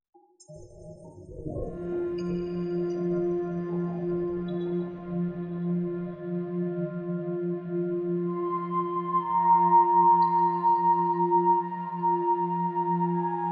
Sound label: Meditation Music Massage